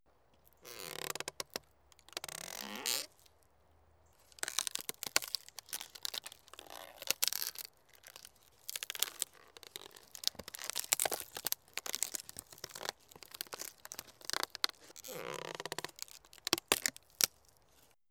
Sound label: Coffin Opening, Scary Sounds; 1001 Sound Effects; Halloween Horror Sounds Effects